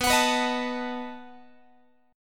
B 7th B down Guitar; strummed; x,19,21,21,19,20

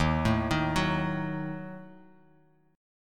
Eb Diminished; Ebdim arpeggiated; x,6,7,8,6,x,x,x; JGuitar

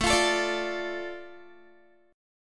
A Suspended 4th Sharp 5th down Guitar; Asus4#5; strummed

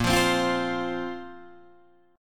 Bb Suspended 2nd, Guitar, Open D

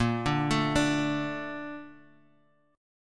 Bb Major 7th, Guitar, Half Step Down

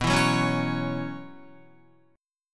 B Suspended 2nd Suspended 4th, Guitar; Bsus2sus4 strummed

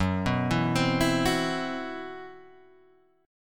C Major 7th Flat 5th F sharp

